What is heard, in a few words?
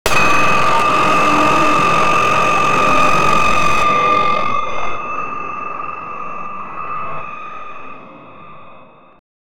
loud static burst glitchy; distortion